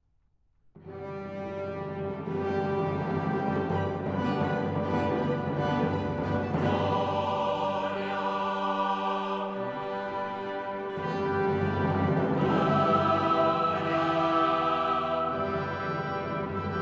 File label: mass in g major gloria, choir